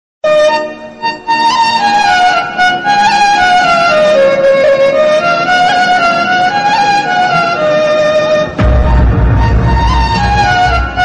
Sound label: movie bgm ringtone telugu best guitar ringtones guitar music; sachin joshi